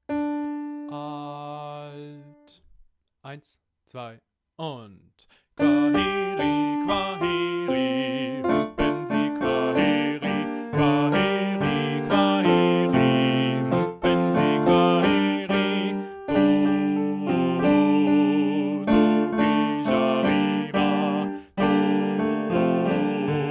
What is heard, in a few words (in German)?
Kwaheri Alt mit Tutti Klavier